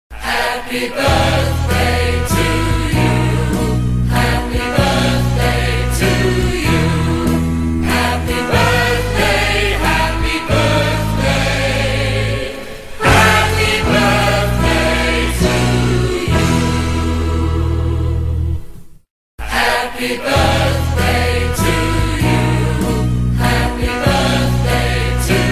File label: birthday songs in engish